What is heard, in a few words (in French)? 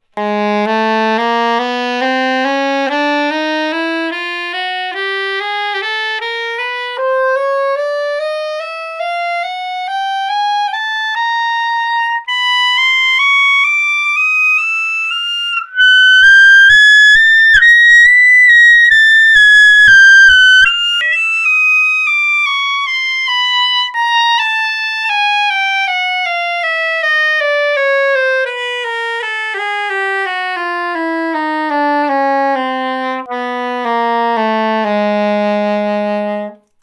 CHROMATIQUE AVEC SURAIGUE AU SOPRANO